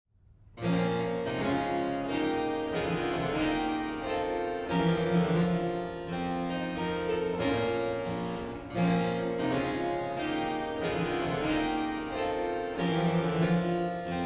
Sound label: Passacaille in B minor